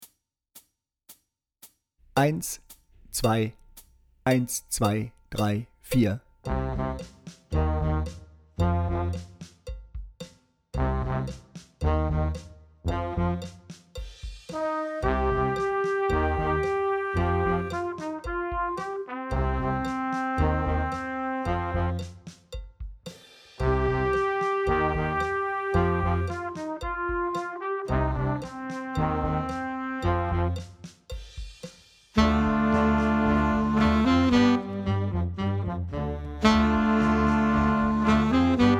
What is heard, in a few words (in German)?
Heavy Metal Altsaxophon lauter